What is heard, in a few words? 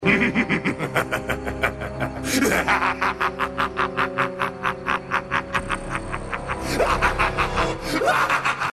Laughing; Megatron; Robots In Disguise television show